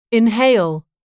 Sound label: inhale